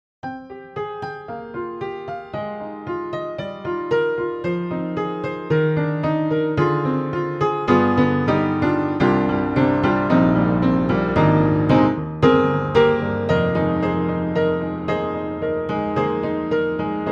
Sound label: Vintage Upright Vintage Studio Mix; Synthogy; Upright Pianos; Ivory II